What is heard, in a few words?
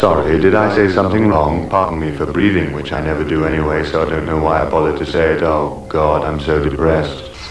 breath in